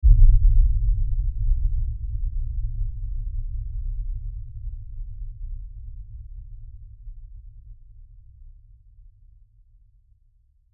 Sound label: Hit LFE Rumble; Production Element Imaging Element Accent Transition; Lasers; Blastwave